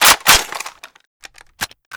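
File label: weapon foley m60 reload chamber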